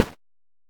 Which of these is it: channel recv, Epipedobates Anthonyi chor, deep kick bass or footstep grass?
footstep grass